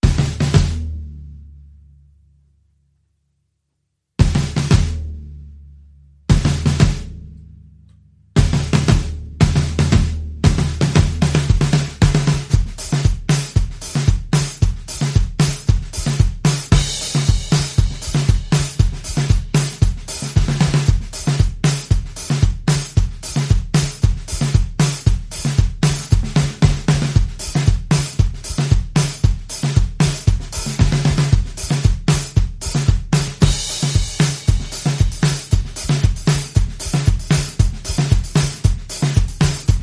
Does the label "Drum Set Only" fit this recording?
Yes